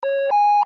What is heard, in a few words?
priority beep